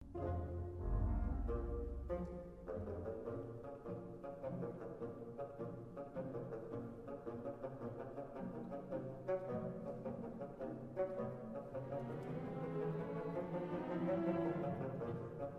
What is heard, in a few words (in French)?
basson; Dukas